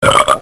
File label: Belch